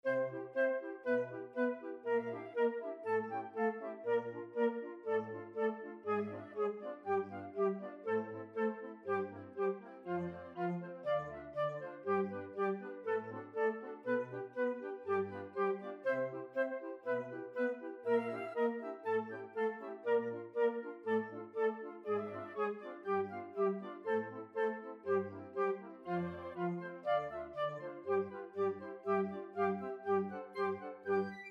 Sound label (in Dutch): fluit; Finale; Carnaval des Animaux; Camille Saint-Saëns